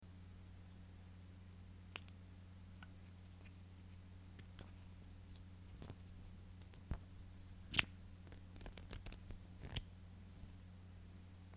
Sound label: russian dolls closing